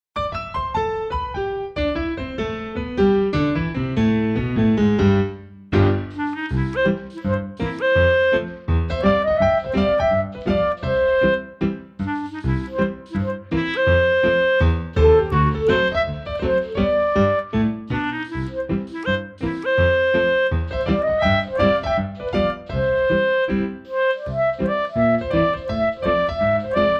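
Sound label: piano play clarinet reference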